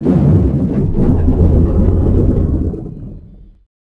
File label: Cap Attack